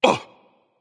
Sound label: grunt hit; smite; voicepack; merlin